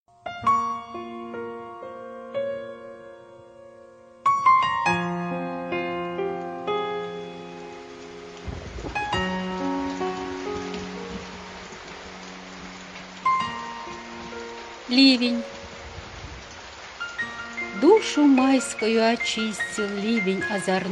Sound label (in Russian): Звук дождя Фортепьяно; Ливень